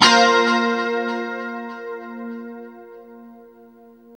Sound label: A#4, Guitars; Spectrasonics; Hans Zimmer Guitars VOL-1; JC-SHEEN